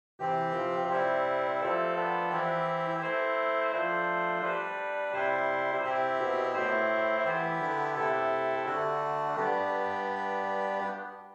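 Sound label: Swell Oboe 8, organ; St Annes Moseley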